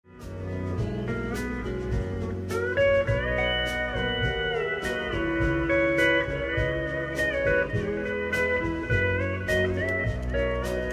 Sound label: happy steel